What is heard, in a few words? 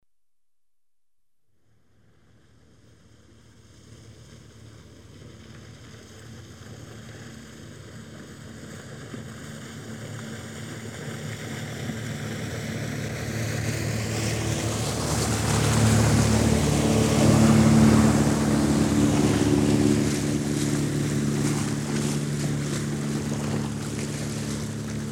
pass right to left, Ships & Boats; The BBC Sound Effects Library